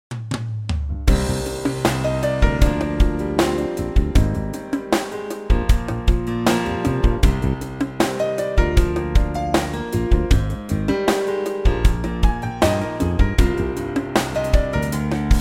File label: Cm Trio